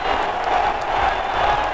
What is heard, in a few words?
CROWD; PC Games.EXE November 1997; Legend98